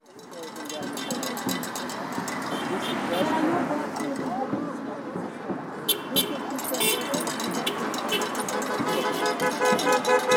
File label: horns